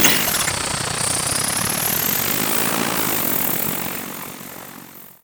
drone released

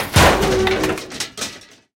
fence metal crash

